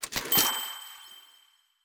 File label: pop skin Purchased shorter